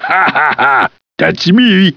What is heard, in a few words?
laugh